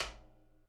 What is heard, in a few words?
Rim Tom